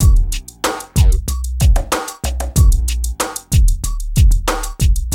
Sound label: BEAT, Beats 93-96bpm